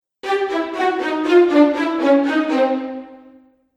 Fail Strings; Musical

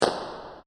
Massive Firecracker Bang; Fireworks; Crafted Signals

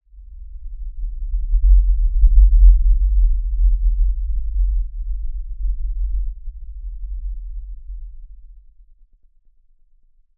LFE Rumble Ultra, Low; Rumbles

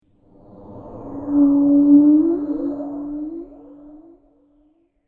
Whale Sound Modulation; Whale Sound Effects; Andrew Fleming

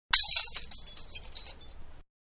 Glass Goblet Broken; Materials; Manhattan Production Music

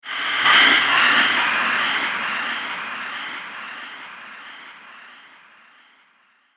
Ghost Chaos Spirit; Ghost Sound Effects; horror

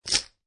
Poker Chip Piles Dropping On Playing Cards; Poker, gambling. Poker chip piles. Pile of poker chips dropping on playing cards; Lynne Music

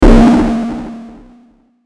Hit Bass Rumble Delay; Blastwave

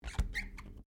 Glass Squeak Rubber; Material components for constructing more complex sounds; Materials; Foundation

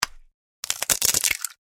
Egg Crack Into Bowl; Egg Being Cracked Into Bowl; food; Cracking; Objects; McKinney Sound

